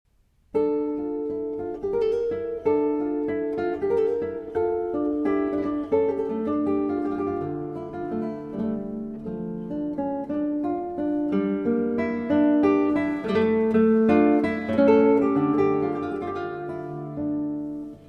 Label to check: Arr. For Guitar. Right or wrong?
Right